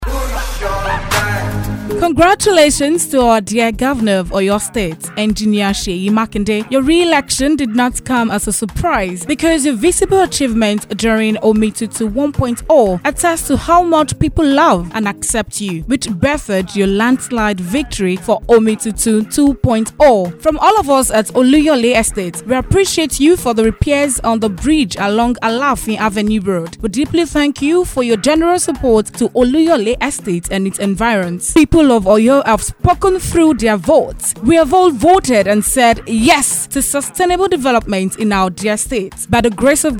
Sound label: Jingle; Oluyole Seyi Appreciation